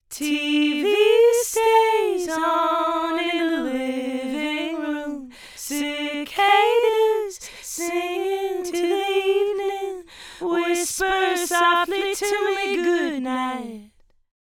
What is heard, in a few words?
vocal dual mono 100ms delay on right, Right side delayed by 100ms; Haas effect